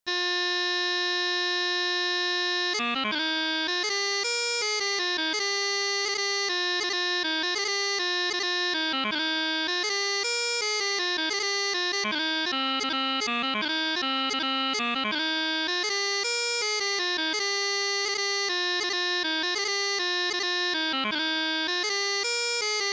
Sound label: Chanter; Highland Laddie